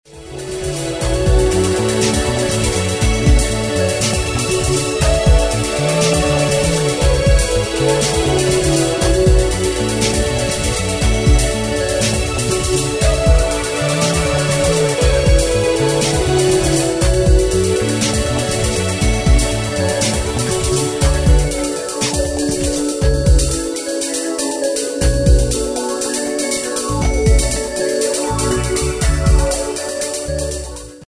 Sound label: Astra Trance